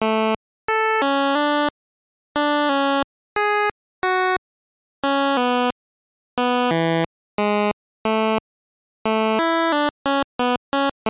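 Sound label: Jingle, Super Game Boy; Boku no Camp-jou; GBC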